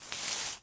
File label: sweep; smite; hades